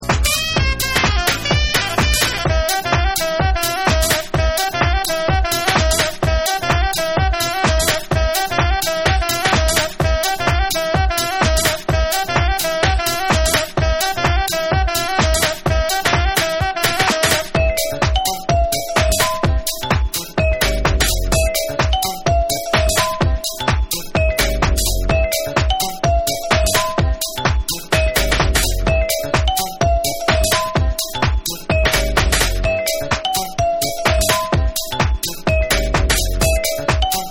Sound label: CLARIPHONE